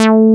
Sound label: JNO SPIK A4, SPIKE BS